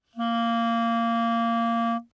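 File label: Eb Clarinet fortissimo Bb4 stereo; Woodwinds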